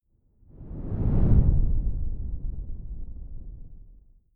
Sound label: whoosh simple low long grainy; swooshes-swishes